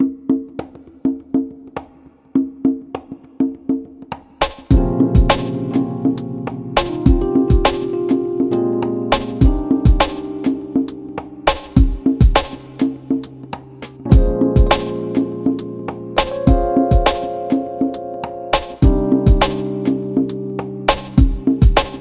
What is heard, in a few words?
Lounge Jam